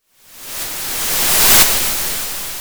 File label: Sound of waves crashing on a shore